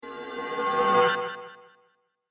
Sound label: Solace Chime Of The Vampire, scary soundscape; Horror Sound Effect